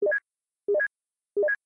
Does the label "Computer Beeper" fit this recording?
Yes